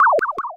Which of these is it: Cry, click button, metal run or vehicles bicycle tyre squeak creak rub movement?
Cry